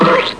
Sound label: delete key; Bedlam 2 1.1.1; Bedlam Sounds